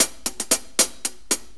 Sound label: 6-8 HiHats, HiHat Ride Loops; Drum Tools